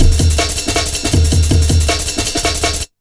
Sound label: Cool Amen, Jungle Breaks; Blu Mar Ten - Jungle Jungle - 1989 to 1999 Samplepack